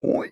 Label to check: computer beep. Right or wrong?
Wrong